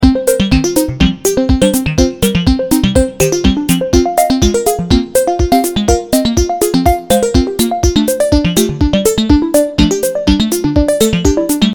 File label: fm sequences